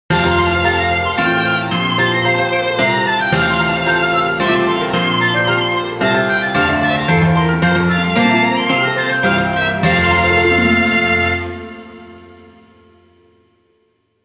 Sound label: BGM Elegant